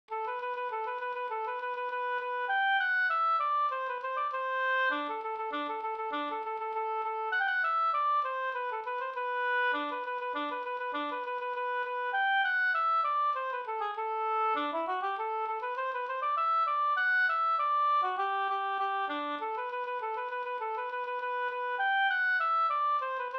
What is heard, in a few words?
polka, polketta; Spelmannens polketta e A Stromberg Sm; Spelmannens polketta efter August Strömberg, Jät, Småland